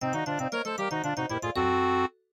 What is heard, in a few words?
MIDI Animation stings